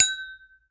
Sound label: Glasses Hit and Ring, Crashes